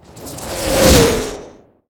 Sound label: artillery incoming, Explosions; Call of Duty Modern Warfare